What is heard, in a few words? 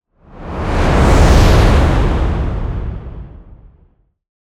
Airy - Whoosh Wind Gust; Rescopic Sound; Distinct Whooshes